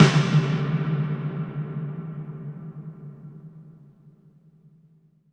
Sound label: IMP SNR DRUM, Drum, Cinematic Impact; M-Audio Pro Sessions VOL-25; Liquid Cinema